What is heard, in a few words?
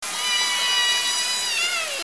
call